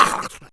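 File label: Caiman Junior damage; Gamigo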